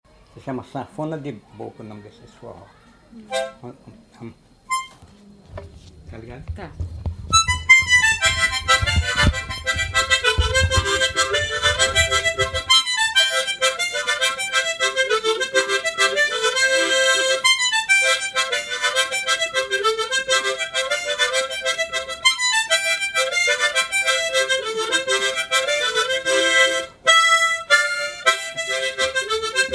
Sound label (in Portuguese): sanfona de boca